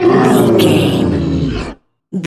troll monster effort struggle

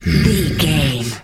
wolf growl

